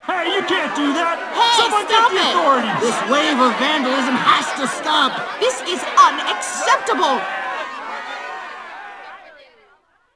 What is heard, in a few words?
Crowd; bully scholarship edition; NIS